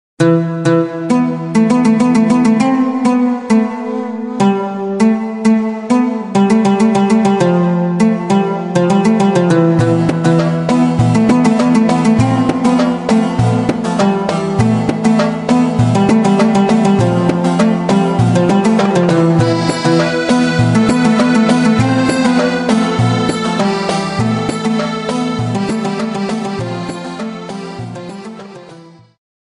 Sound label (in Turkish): Telefon Zil Sesleri Guzel Bir Cep Telefonu Zil Sesi